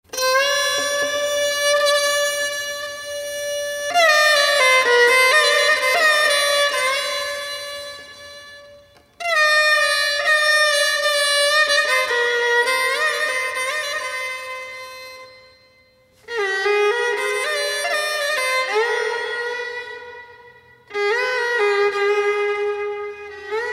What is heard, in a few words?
Violin